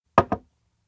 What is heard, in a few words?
bowl place table; anemoi